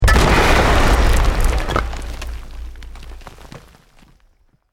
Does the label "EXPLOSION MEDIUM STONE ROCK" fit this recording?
Yes